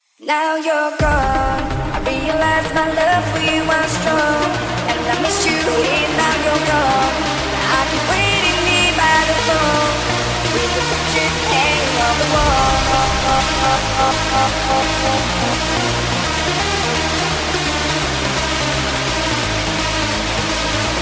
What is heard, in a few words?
Hardstyle Remix; Nightcore